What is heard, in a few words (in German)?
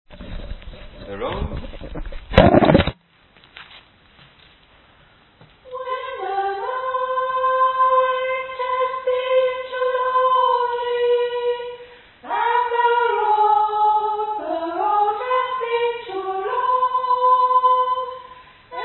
Sopran